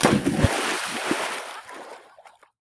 Diver Dive; Aqua Lungers

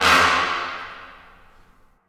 String Small Brass

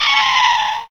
Gryphon Hit; the battle for wesnoth

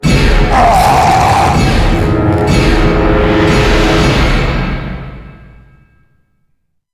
String Large Zombie

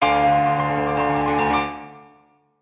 Dramatic Chord; gamerip; Baron: Fur Is Gonna Fly; switch windows xbox one

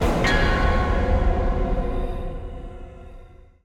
Hi Hit

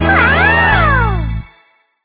bubble pet levelup; game rip; line bubble

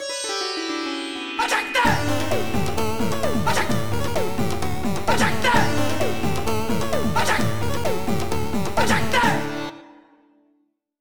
Jingle Enter; Dandy Dungeon Legend of Brave Yamada

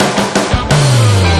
Loading intro; novadrome; xbox 360

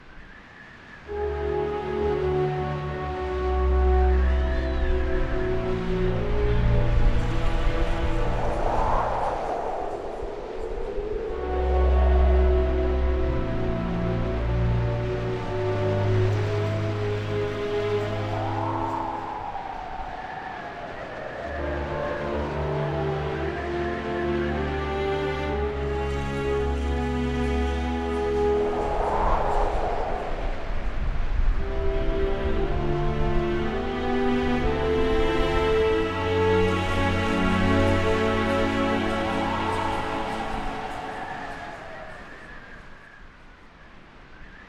bgm entrance

